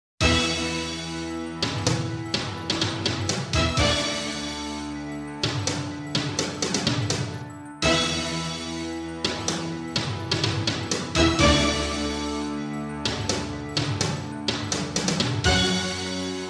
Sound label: Jingles; 90 DDD Jingles